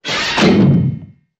Hatches Spaceship

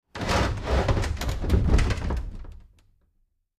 Large Chest Slide

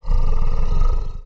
CREATURE GROWL

